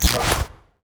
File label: baton unequip